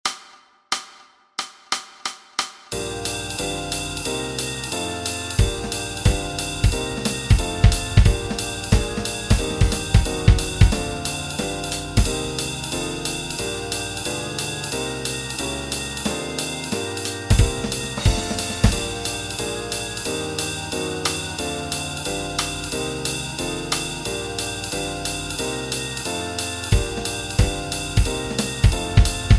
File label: Backing Track, walking bass